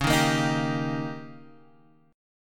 D sharp 7th C sharp, guitar

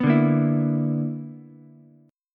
B-Minor Major 11th, Ukulele C6; BmM11; strummed; 4,2,6,7; JGuitar

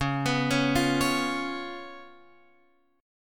C sharp 7th C sharp, Guitar Open F; C#7; arpeggiated; JGuitar

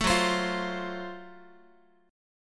F sharp Minor Major 7th Flat 5th, Guitar; strummed; 12,14,15,15,x,x; down; 4A,4E,5A,5D,5Gsharp,6Csharp

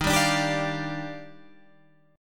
D sharp Major 9th, Guitar Open G

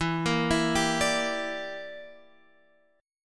G Minor 6th E

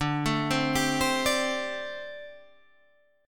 G Suspended 2nd Suspended 4th D

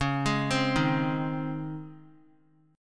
C sharp Major 7th Suspended 2nd C sharp; C#M7sus2 arpeggiated; 25,27,27,25; JGuitar

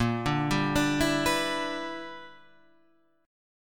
E Minor 7th Bb; arpeggiated

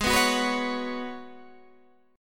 Ab Minor 11th, Ab, guitar chord

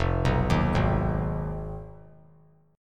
F Minor 7th Flat 5th; arpeggiated; JGuitar

